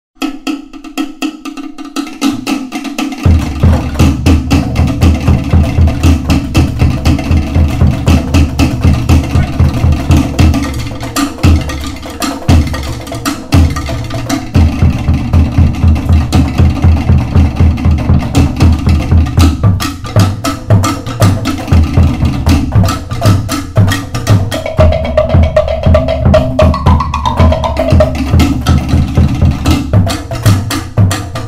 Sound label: beat; polymusic